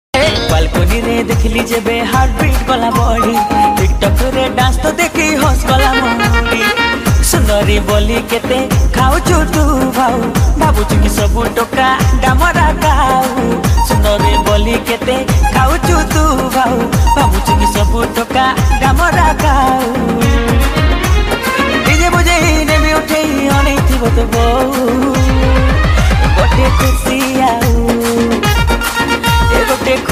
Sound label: Odia Song Ringtone